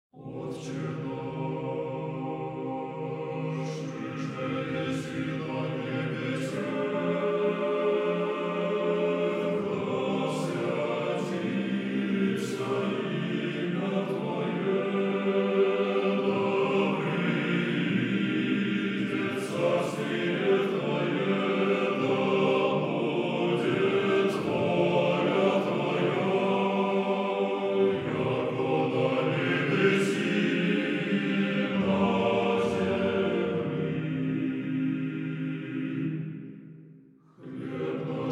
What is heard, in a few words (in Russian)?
Мужской хор; Пересвет; Отче наш